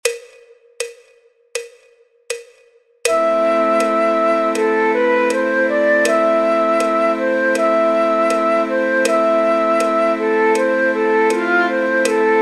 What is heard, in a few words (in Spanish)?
El Lago de los Cisnes Flauta Travesera Flute en La menor Am